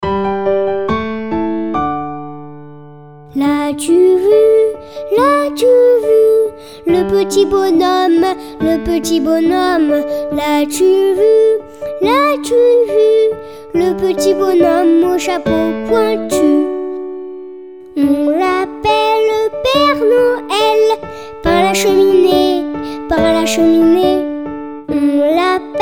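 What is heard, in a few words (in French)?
Le petit bonhomme, comptines pour les tout petits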